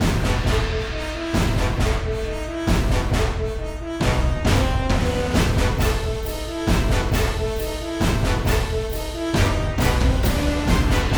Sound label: epic dark side; Jamie Coleman, Film Composer